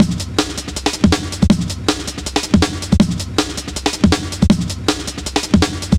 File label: 160, Drum Loops; Total Drum&Bass